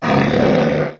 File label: drake hit; wesnoth